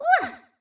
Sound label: pickup; toadette; sm64coopdx; char select extra chars